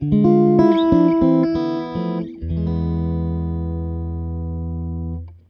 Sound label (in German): guitarre, instrumente